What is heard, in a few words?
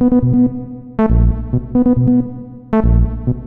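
double bassline, techno 138 bpm; Best Service ProSamples vol.54; AKAI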